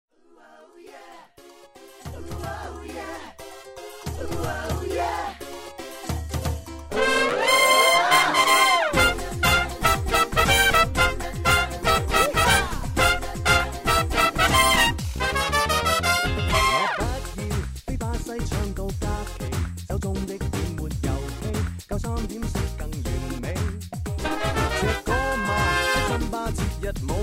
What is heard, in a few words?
Brass; EC MarioParty Rejected Brass Excerpt